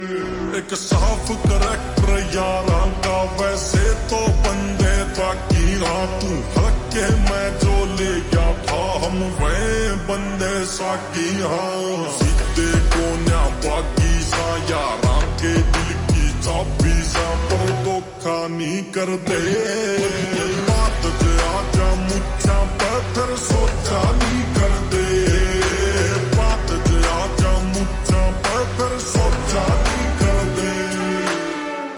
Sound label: Ringtone Slowed Reverb